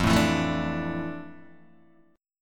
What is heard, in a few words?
F Major 13th Guitar Open D